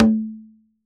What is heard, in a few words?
Drums Percussion Congas